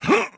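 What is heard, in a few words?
voice jump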